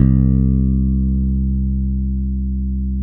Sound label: BASS C2, Electric Basses; E-MU Producer Series Vol. 8 – Platinum Phatt